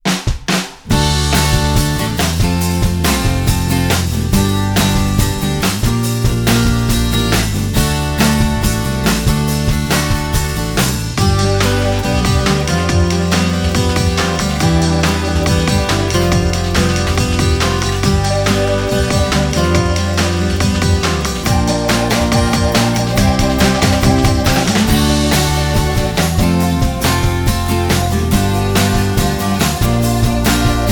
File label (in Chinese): 欢快的背景音乐